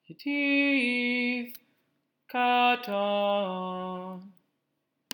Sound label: Torah Trop Pattern